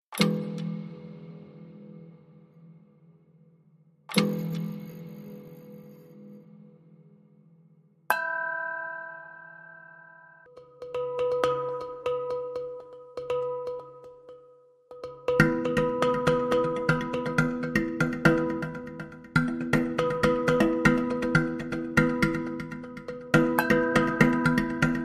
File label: Anxious Rhythms Tension Pattern Only